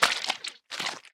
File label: spawner gore; factorio 2.0.55